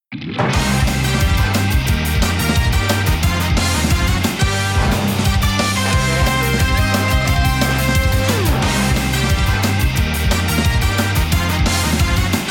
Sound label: God Hand Dance; street fighter 6 beta; ps4 ps5 windows xbox series xs gamerip